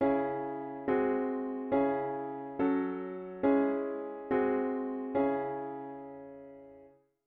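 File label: diatonic inverted V7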